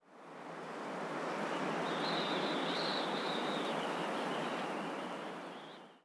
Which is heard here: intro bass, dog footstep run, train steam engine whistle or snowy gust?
snowy gust